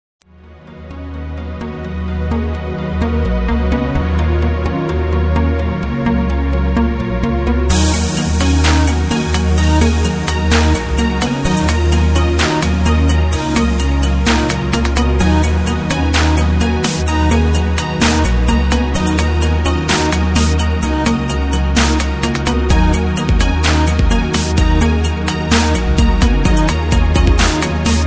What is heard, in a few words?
Exhaling Downbeat mix